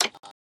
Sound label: Flashlight Out Of Batteries